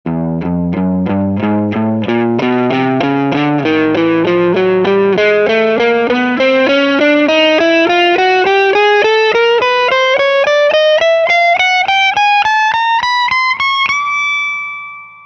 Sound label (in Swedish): Elgitarr